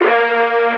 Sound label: vocal hype Bb; SD AURORA Sample Pack; Slate